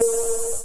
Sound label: CRASH, Dance Mega Drums; Best Service